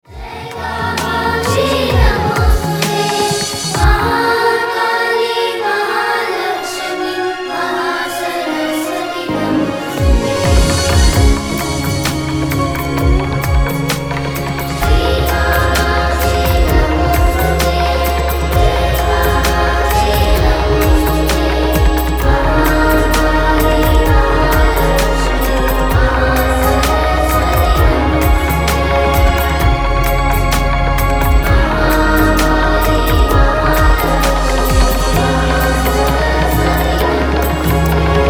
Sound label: The Chant of Shri Kamakshi Devi